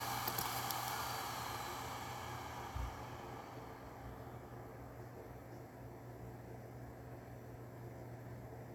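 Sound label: Spindown, hard disk drive; HDD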